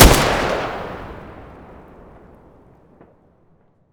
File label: svd muzzle mono distant